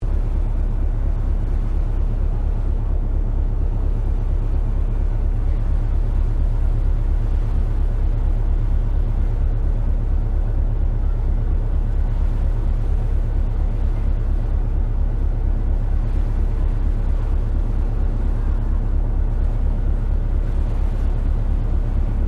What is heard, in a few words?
Boat Ferry Freshwater Class Sydney Engine Ambience Stereo; Manly Ferry; Boats (Sound Effects:Vehicles)